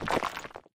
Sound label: Space Rock Crack; Doodle Jump 2; android